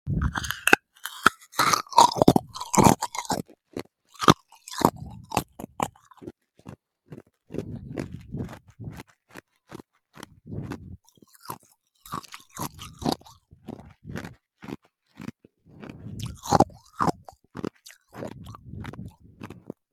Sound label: mysterious crunching